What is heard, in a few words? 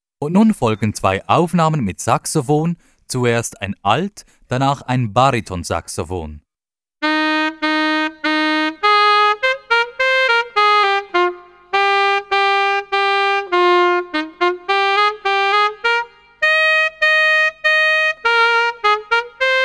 Saxophon